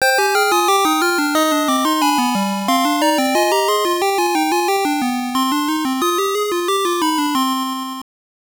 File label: Death; ALF; Master System